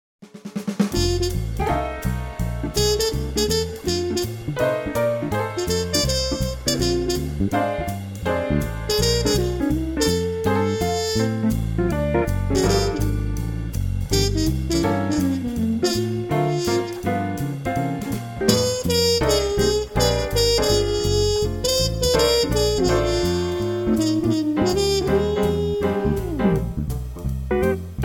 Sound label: Jazz